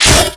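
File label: blade slice robot; melee impacts; Garry's Mod; tf2; mvm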